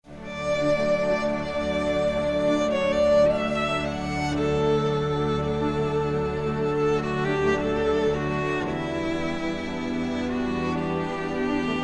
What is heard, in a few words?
Classical